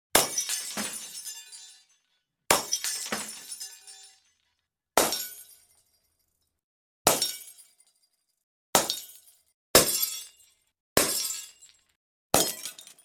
Bulb Break

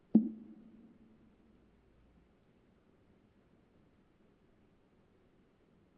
Footstep Neon

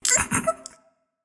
Giggle Laugh

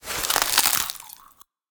Eat food

